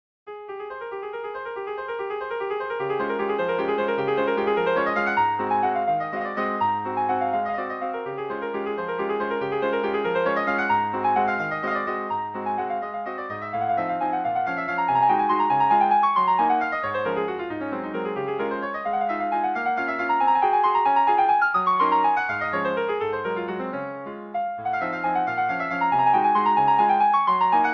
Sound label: waltz